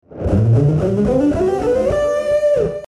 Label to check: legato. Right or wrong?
Right